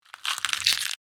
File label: Egg breaking crack cooking